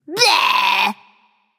tongue, voicepack; smite; pele

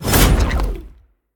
projectile; smite; olorun

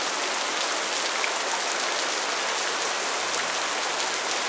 rain lp; smite; chaac

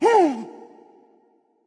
surprised; smite; voicepack; sylvanus

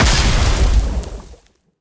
bomb explode; smite; vulcan

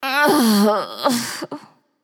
ugh; smite; aphrodite

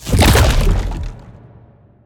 activate; Smite; god Kuzenbo v2

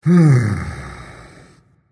content, voice; Smite; ymir skin6

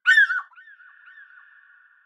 ambience good bird; env s8conquest; smite

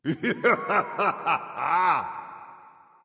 thor laugh; smite; voicepack

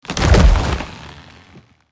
death stumble; argus; smite; hera

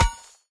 staff ground; smite; chronos

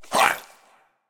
hitreact small

